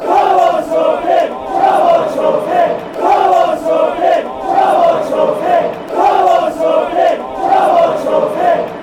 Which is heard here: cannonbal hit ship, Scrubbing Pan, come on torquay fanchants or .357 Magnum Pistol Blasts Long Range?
come on torquay fanchants